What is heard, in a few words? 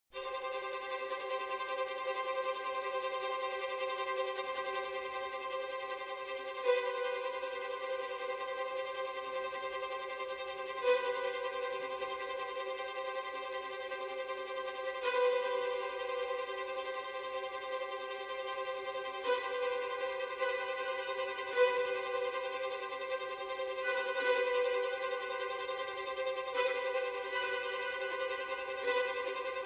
shaker loops